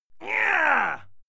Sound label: male yell strained